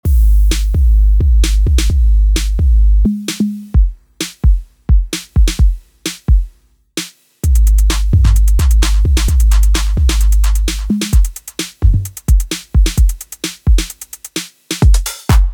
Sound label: SP202 Hi-Fi Drum Machines